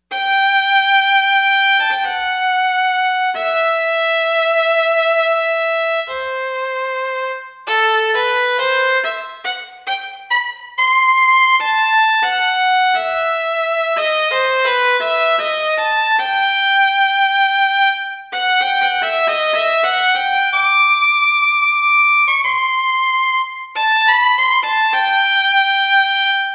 Violin, Yamaha TG55; Factory Presets

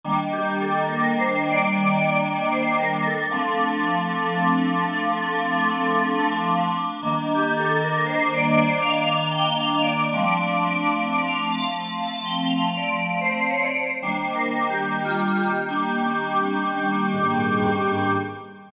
Digi Wave; Generalmusic Equinox

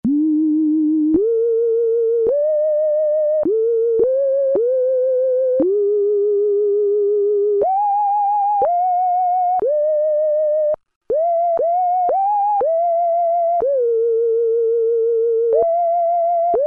Roland Juno-106; Factory Preset

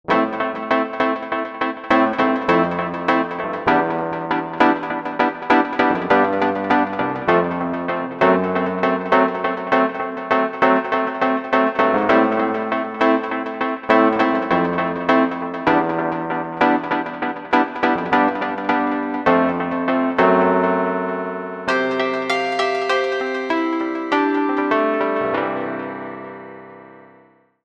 Supatramp EP, E-mu Proteus VX

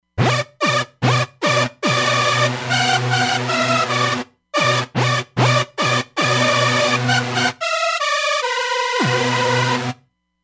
Slipping Saws, Roland Fantom XR

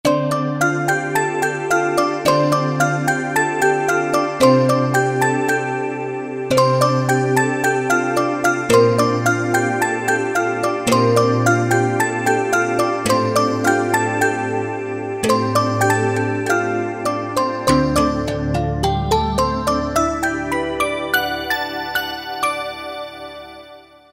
Plucky Toys, Kurzweil K2000; Kurzweil K2000 Contemporary ROM

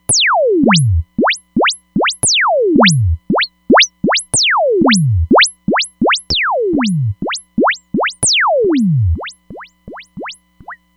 Zap B3 & C4

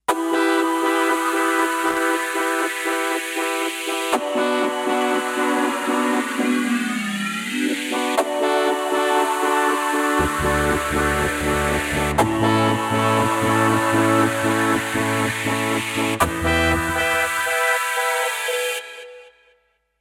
Powr Gate, Kawai K5000S

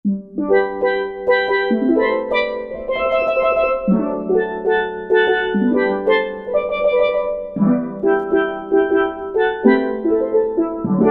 Still drumin, Roland SRX-03 Studio SRX

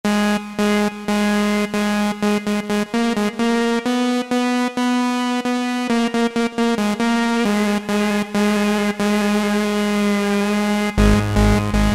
Rave Nature, Roland SR-JV80-11 Techno Collection

